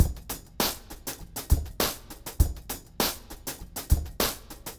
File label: Remixed Drums 100BPM; The Roots Drumloops